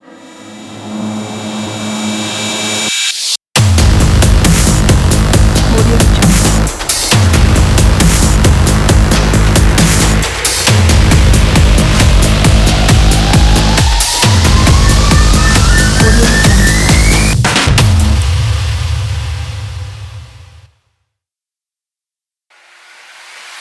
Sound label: intro logo pack